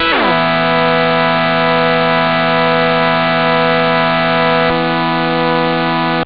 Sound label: LASER